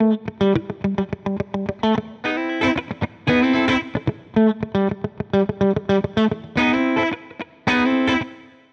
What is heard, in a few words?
reverb